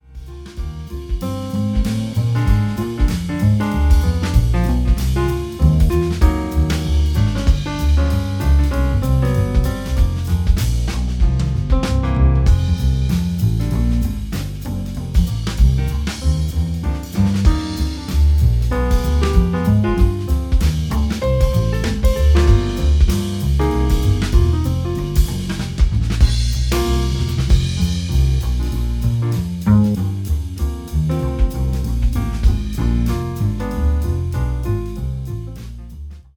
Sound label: jazz trio